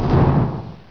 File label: BOOM; THEREALM